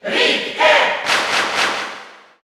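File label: Cheer Italian, crowd; SSBU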